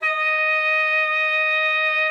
D#5, ALTOSAX; Xsample VOL-2

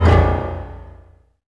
HIT A#, Orchestral Loops; 128 COAST ROAD

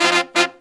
Phantom Horns, 132BPM; 90 s Sample CDs; Zero-G

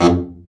BRA BARI, Bariton Sax

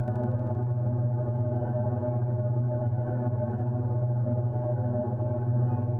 Tonal Re Draw, sparse soundscape

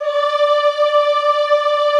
EHH D4, Classical Choir; Best Service; Extended Classical Choir; EHH MIX

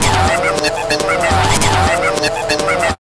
Fast Rewind; Zero-G; Soundclash Nu Dance Electro; Acidized Wavs; FX Atmospheres

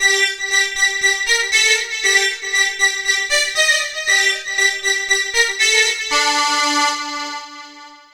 Lead, Synth, Leads; Sonic Foundry (Sony Creative Software); Electrocution

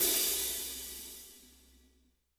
16 HAT, DRY KIT; Spectrasonics; Burning Grooves CD2; DRY KIT 4

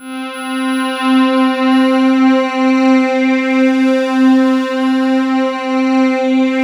analog C4, ethereal atmospheres; Soundscan - Ethereal Atmospheres

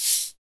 shaker, percussion

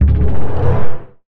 Percussive Hit, contact mic

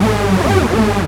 DR. LOOP, BUZZ; X-Static Goldmine 3

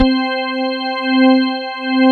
ALL STOP, CHURCHY

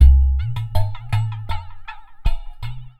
Metal Udu; Sonic Foundry (Sony Creative Software); Ma Ja Le Ethereal Textures

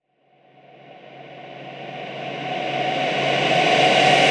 Reverse Vocals G; Vengeance - Essential Clubsounds Vol.4; Effects

